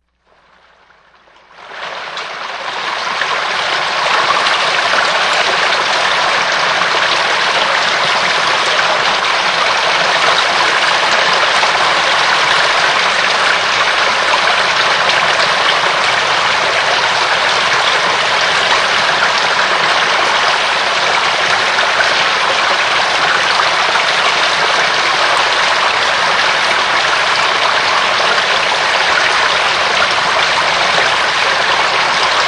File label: brook